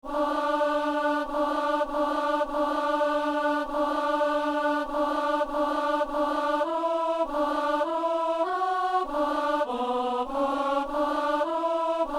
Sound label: alto; Peuple fidèle; Messe du 17 janvier à Saint-Denis